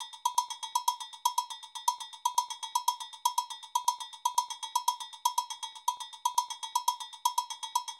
120 Bell, Nu Jazz House; PowerFX

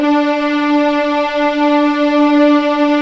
D4 EXP MF, VIOLINS; East West; The Ultimate String Collection

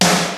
GATE SNARE; Crypton - DrumBase5000; GATE KITS

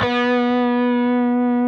PRS C, Guitars, LEAD; Spectrasonics; Hans Zimmer Guitars VOL-1

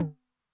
Mid Tom High Tune Short Decay, 909; classic machines; 909mod; MT - MID TOM

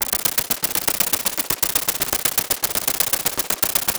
Gated Noise 120BPM; musicradar

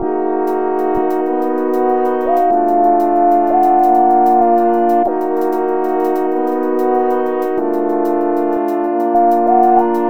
Melody, Hip-Hop

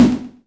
tom, Korg Electribe ER-1; Kit 20

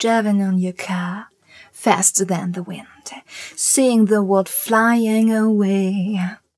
f mel g, fem Vocals

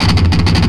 Riff, Industrial; Nasty

